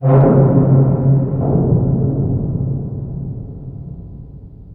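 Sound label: metal scrape reverb; ambient atmosphere; Counter Strike Model Pack; CS:GO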